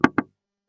wooden

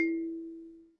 kalimba

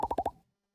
pop

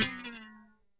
drunk guitar

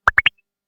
pop

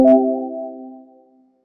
synth pluck

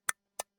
wood click

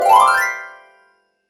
chimes

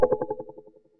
buzzer

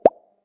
bubble

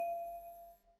glass

